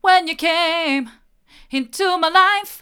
hard, vocal

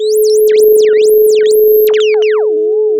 Sound of a police car siren

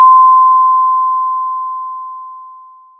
pop, Sound of a doorbell

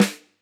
close Snr, drum

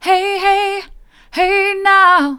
hey now mid, vocal

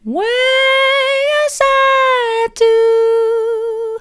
way as i do soft, vocal

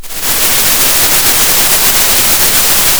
Sound of a thunderstorm

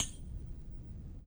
Vinyl Perc

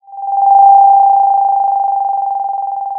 Sound of a phone ringing

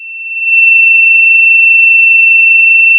Sound of a tea kettle whistling

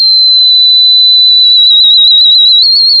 pop, sound of a tea kettle whistling

